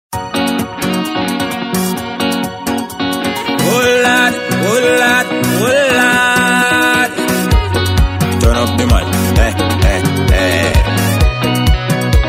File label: soca